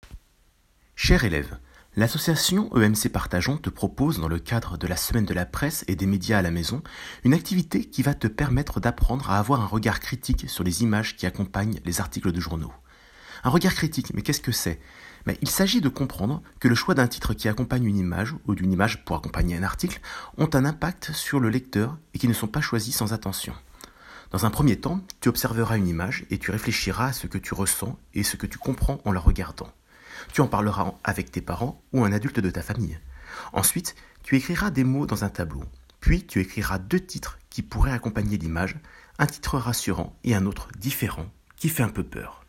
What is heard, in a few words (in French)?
Audio enfant